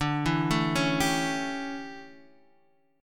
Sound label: D Major 7th Suspended 2nd Suspended 4th Guitar Standard, chord; arpeggiated